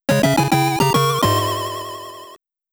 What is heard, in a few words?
START (PSG); New City Hero